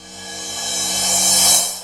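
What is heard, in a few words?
E-Mu Proteus FX Reverse Cymbal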